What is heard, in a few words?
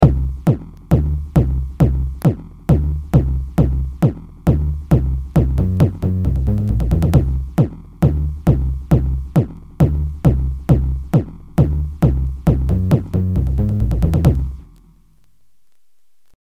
dnb roll; bigsteps